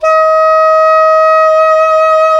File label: SAX SOP MF, Soprano Long; Roland LCDP07 Super Sax